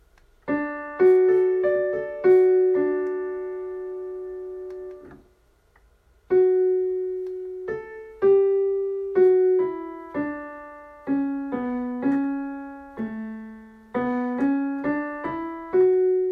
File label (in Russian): Диктант 5 класс ре мажор